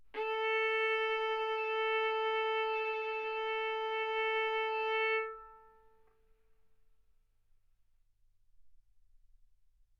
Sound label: Violin arco A4 B4